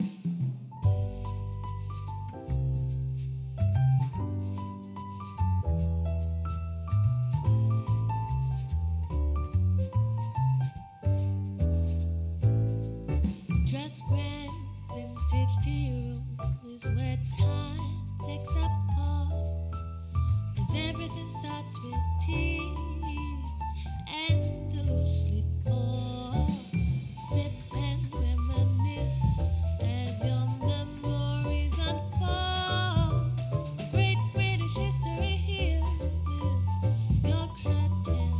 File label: Tearoom Jingle; Miss Trixie Holiday; Grand Vintage Tearoom